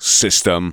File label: vox; Ueberschall; Techno Trance Essentials